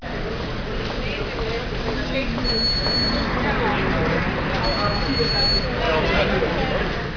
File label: people